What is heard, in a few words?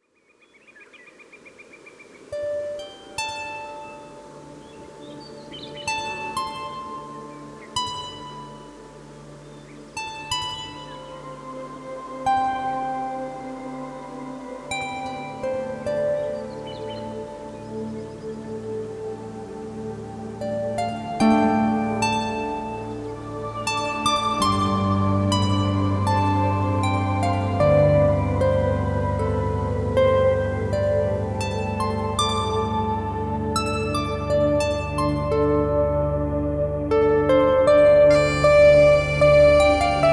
Celtic Forest; Celtic Myths